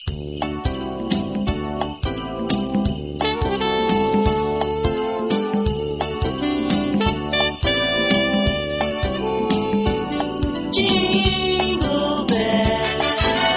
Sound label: Jingle Bells